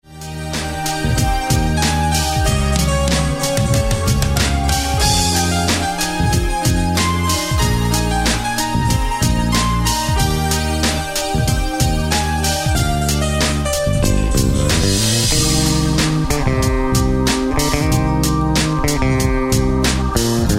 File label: bassolo